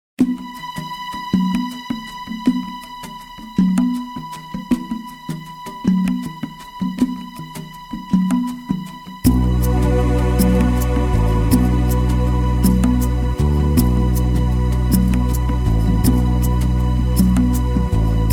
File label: Backing Track